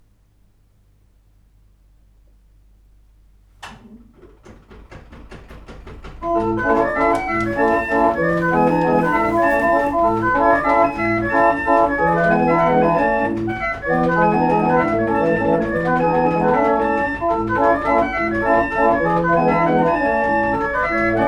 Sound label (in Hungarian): fuvolaóra feketeerdő 23 hangú 8 dallamú németország